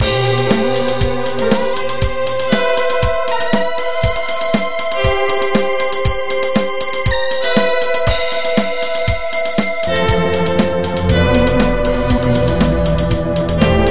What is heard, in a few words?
Trance Remix; FL Studio